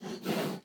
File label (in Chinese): 熊猫鼻息声